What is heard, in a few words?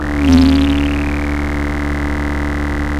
Sound of a cruise ship horn